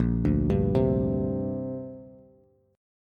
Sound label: Bb 6th Add 9th Bb Bass; Bb6add9 arpeggiated